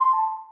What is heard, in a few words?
chime